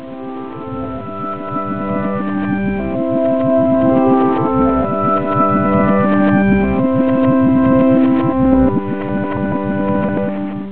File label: old melody